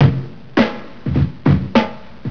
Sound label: drum